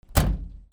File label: CARS